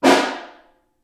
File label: SN Far, Snare